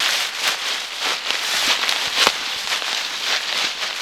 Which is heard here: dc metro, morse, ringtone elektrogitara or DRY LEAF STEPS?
DRY LEAF STEPS